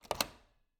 Cabin, front door, deadbolt, lock, latch, thick, open, wide; Eiravaein Works; Vaeyan IV